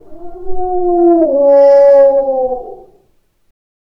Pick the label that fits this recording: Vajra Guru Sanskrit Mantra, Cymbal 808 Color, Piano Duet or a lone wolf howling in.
a lone wolf howling in